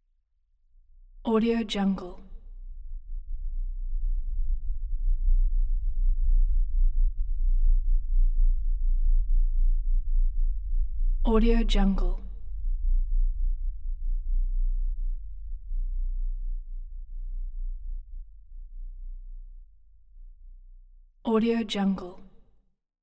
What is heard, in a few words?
lfe pulsing ring out; collanderson